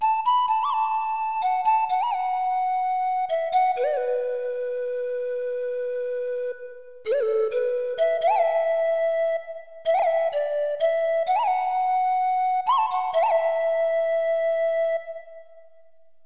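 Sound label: pan flute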